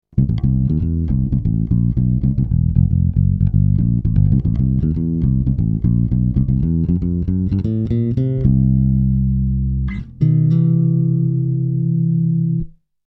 Fingerstyle Bass; MAXON CP9PRO+

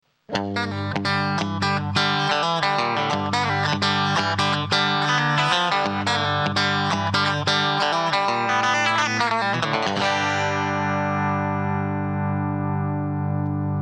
Deep Comp; MAXON CP9PRO+

